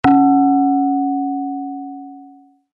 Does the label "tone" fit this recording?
Yes